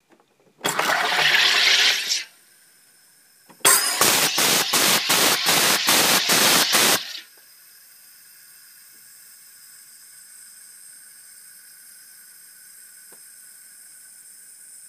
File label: Hydraulics Bubbles